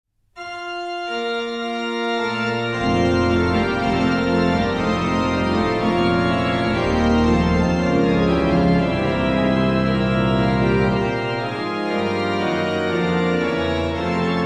Bach Organ Works; BWV 620; Christus der uns selig macht; Orgelbchlein

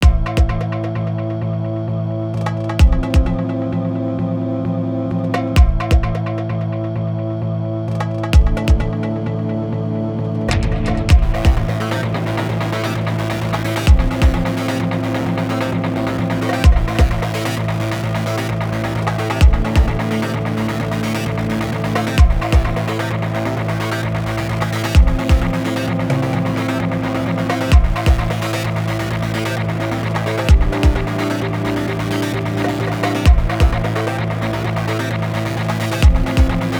Busy Electronic repeating Pulse; CUES for film TV games etc

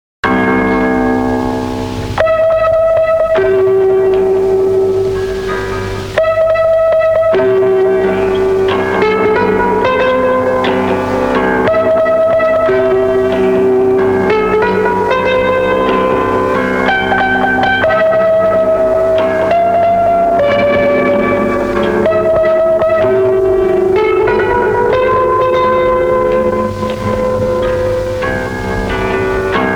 Piano Instrumental; Joe Meek Demos

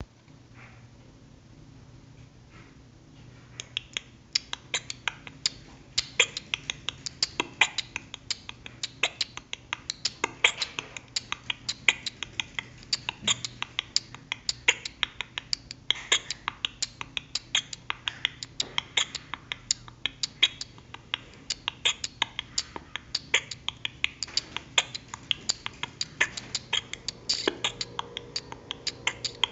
Unwrapped Beat Box

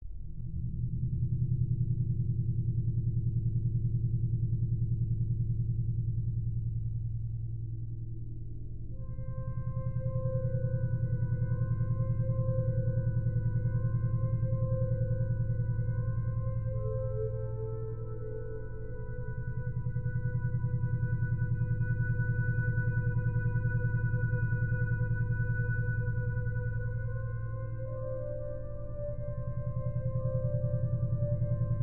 Time Flutes place

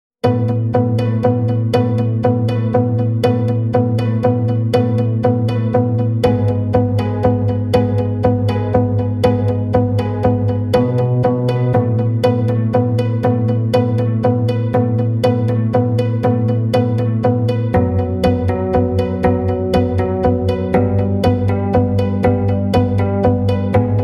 Tense-Drama-Unease-Mostly strings with end drums

